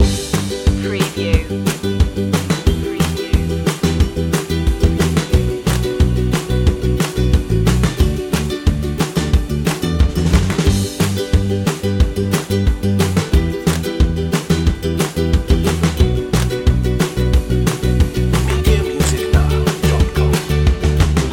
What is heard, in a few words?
life loop